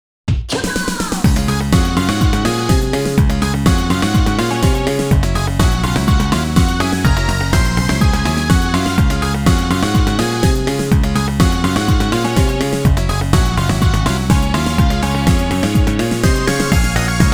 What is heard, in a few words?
Vehicle Handling; Persona 2 Innocent Sin